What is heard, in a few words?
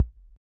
Kick; Ableton Live 12 Lite; Core Library; Drums